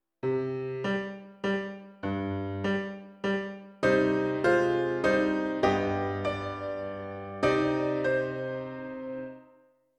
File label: Piano